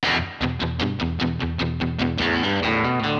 Chill Pop Guitar 8Th, Em, Chill Electric Guitar; Pop Rock

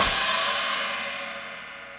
China Dark Plate Verb, Cymbal; Drums; Drum Hits; Standard Hits; Ableton; Factory Packs; Session Drums Club

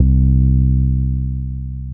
Bass

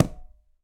Conga A Mute; Latin Percussion; Drums; Drum Hits; Ableton; Factory Packs

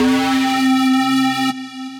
Buzz Talk, Synth Lead

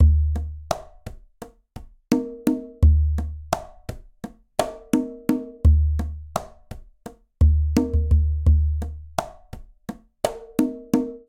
Guajira Conga Tumba 85bpm; Latin Percussion; Ableton; Factory Packs

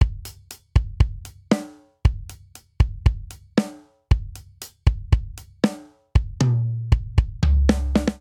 Straight Rock Intro 117 bpm; 4 4; MIDI Clips; Ableton; Factory Packs; Drum Booth

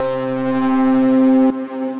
Solo, Synth Lead; Commander Solo; Ableton; Factory Packs; Punch and Tilt

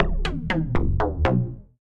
Bass Bubbles, percussive; Ableton; Glitch and Wash

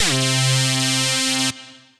Pulse Riser, synth lead; Ableton; Glitch and Wash

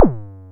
modular pitched, Kickbank; Ableton Foundation FreePacks

